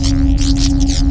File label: probe anim loop; Earth and Beyond; EA Games